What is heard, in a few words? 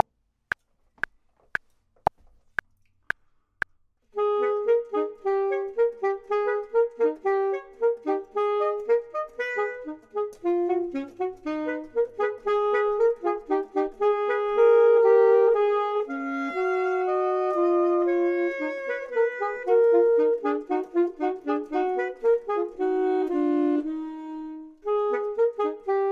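both, Saxophone and Clarinet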